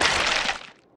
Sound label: mined, mining; funneltrunk; Factorio